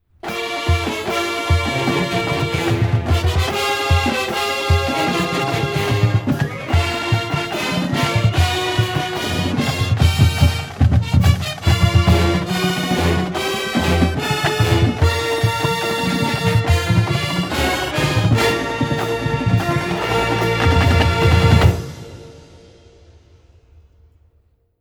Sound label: Fanfare, marching band; Fulton Cougar Fanfare